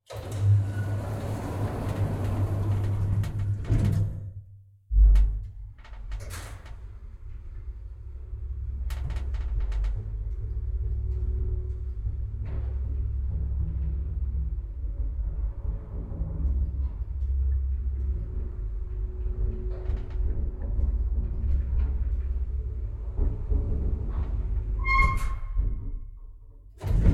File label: OLD STUFF Elevator Door Open, Close, Go up